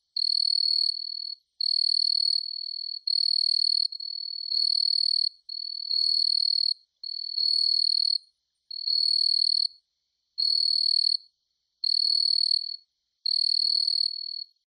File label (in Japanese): kaya hibari